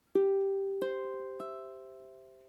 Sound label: chord gce, ukulele